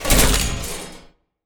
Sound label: broken engine old damage fractured smashed; machmech